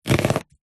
food chop onion with knife single slice

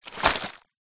impacts tree bark piece wet drop ground; zapsplat

